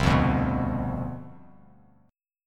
C Major 7th Suspended 2nd Suspended 4th C down, Bass Standard, chord; CM7sus2sus4 strummed; JGuitar